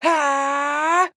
Charge

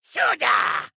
Combat; Stripe

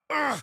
AHurt

